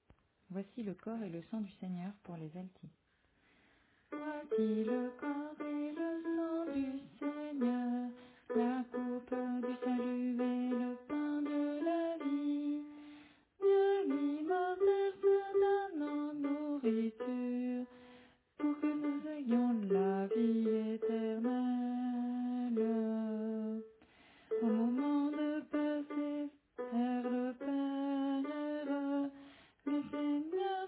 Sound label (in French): Alto